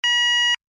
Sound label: alarm tone, sounder alarm tone; sounder beacon